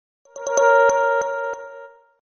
message alert

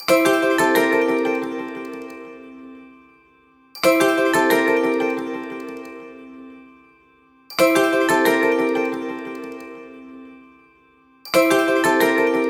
Sense, ringtones

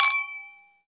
camera app Capture; Nokia; C6-00

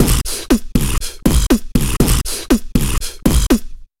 B Box, ringtones; Huawei Ascend Y300

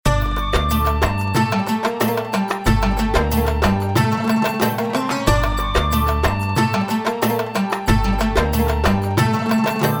Magical Flute, phone; LG

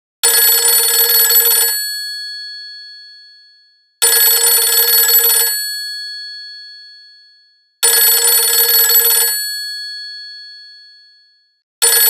Classic Ring; Vertu; Constellation T

